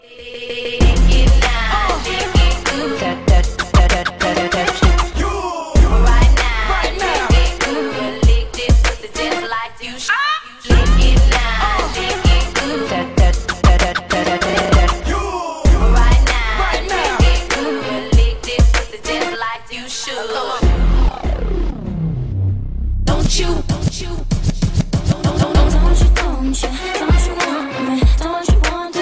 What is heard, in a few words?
arabic style mix